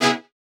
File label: chords